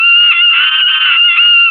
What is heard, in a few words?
dinos; Peeping Pete - The Search for Smokin' Sue